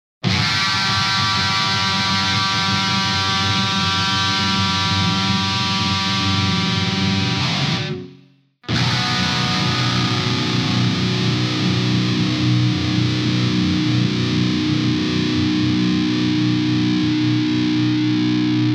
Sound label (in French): ACCORDS G et B; Damien Elite